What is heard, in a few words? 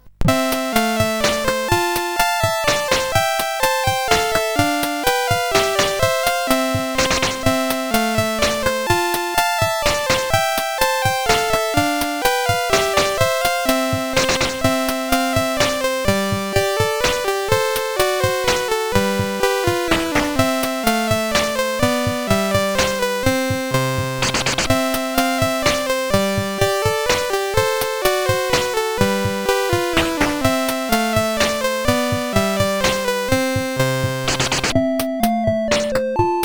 Basic Gametune sid CSG8580R5